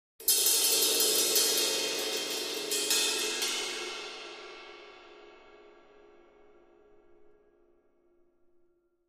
Cymbal Trill

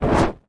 Torch Movement; torches

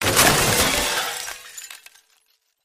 Metal Wood Glass, mixed

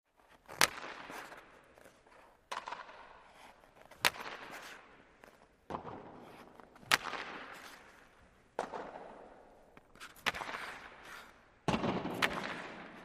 Hockey Multiple, hockey

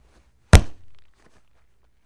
Football Catch Dull

